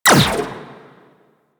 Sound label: Energy Rifle Mech; Warframe; Weapons